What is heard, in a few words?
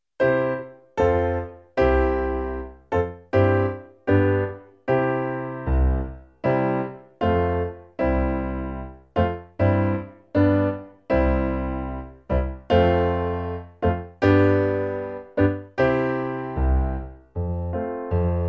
PIANO; Joy to the world